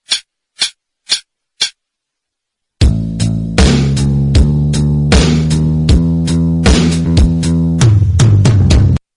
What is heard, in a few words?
last backing 78